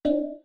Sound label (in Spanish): campana fallo